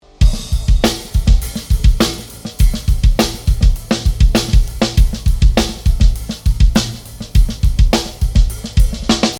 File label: all eq rev, batterie; ajouter le micro claire-dessous et les OH